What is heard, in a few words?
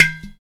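Udu Pot Mute, percussion; Roland BK-5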